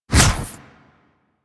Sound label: Drill Flame Burst; Warframe; Grineer Ghouls; Ghoul Drill Sergeant; Attacks